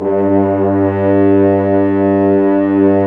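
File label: French Horns f, Dry; Roland L-CDX-03 Disk 2; F.Horns 2 f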